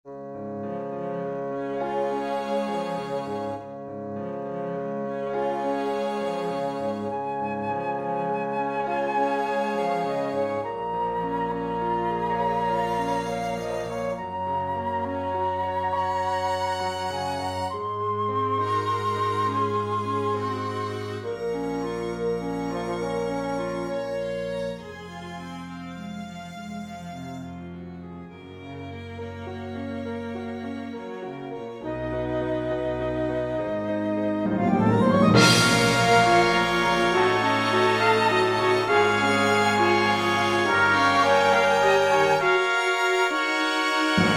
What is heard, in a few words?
Orch